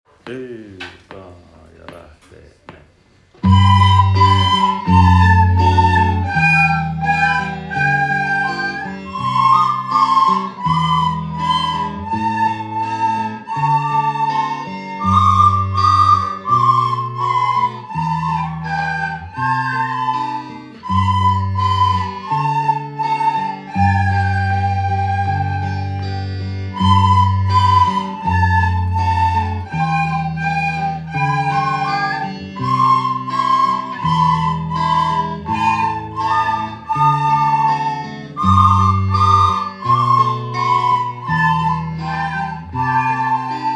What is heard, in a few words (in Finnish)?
ostinato; Kotka